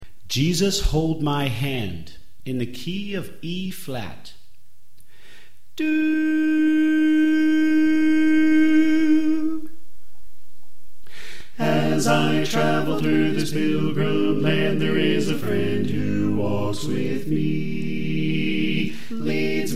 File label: Tenor Left